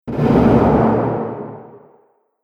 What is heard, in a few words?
Horror Explosive Impact